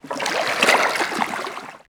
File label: battl water